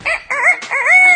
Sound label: Rooster Msg